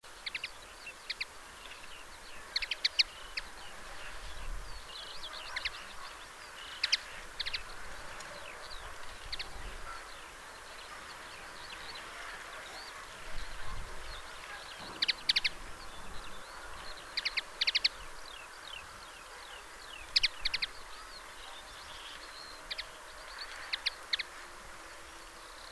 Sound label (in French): linotte melodieuse appel